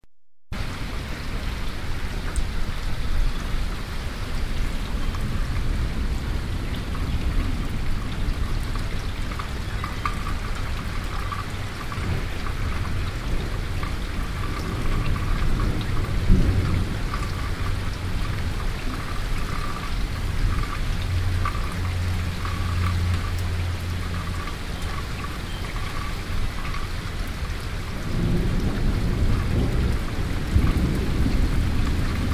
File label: rain and thunder